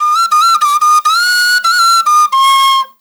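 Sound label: PENNY WHISTLE; Spectrasonics; Heart of Africa 2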